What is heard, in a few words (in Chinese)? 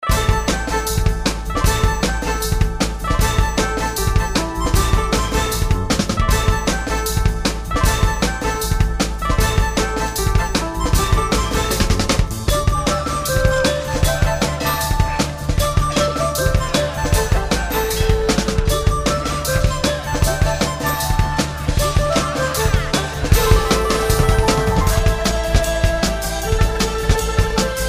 战斗 城市